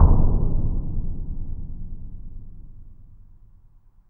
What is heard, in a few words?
LOW NOISE; BEAT2000